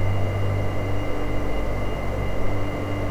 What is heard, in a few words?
ambient; dystopia; assemble